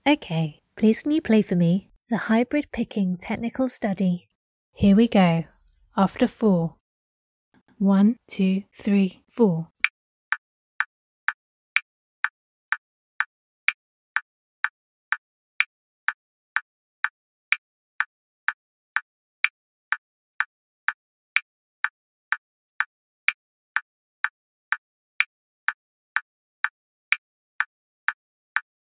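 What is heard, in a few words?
Hybrid picking study, Guitar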